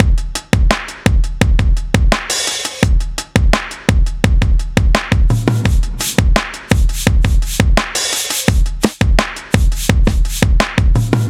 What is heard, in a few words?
Drum Break, Trip-Hop Loops; Sonic Foundry; Rondo Brothers